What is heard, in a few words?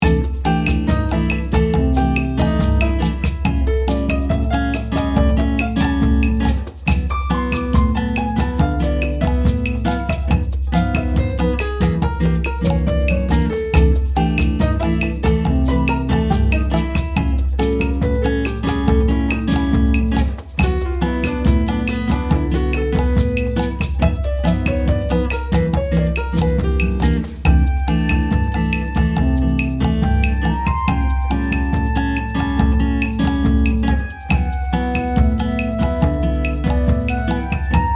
RETRO